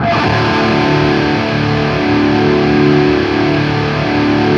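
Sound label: power D loop, guitar power chord loop; East West; Steve Stevens Guitar Samples Collection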